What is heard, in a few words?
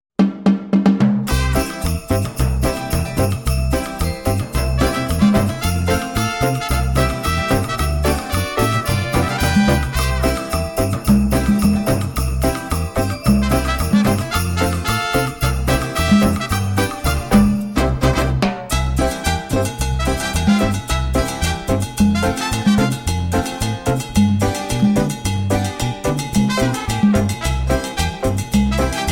JINGLE BELLS CLARINET HORNS ACCORDION